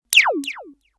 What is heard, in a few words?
laser